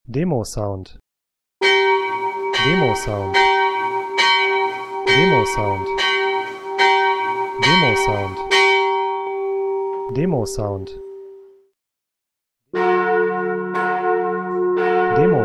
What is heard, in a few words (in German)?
Glocken